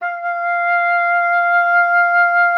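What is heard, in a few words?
F5, oboe d'amore; Xsample VOL-7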